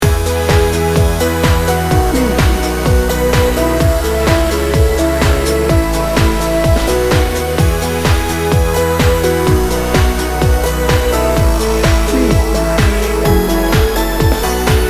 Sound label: joyful edm loop